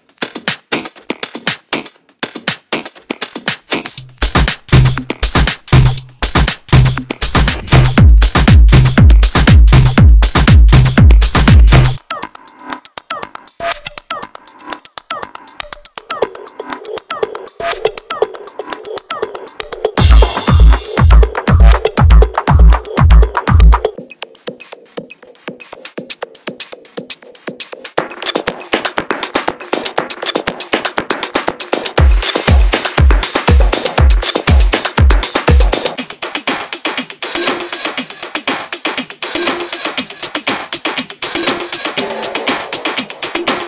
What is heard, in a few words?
Kick Free Experimental; Mutekki